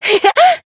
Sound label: Voice attack; pom